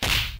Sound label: Punch; RedDot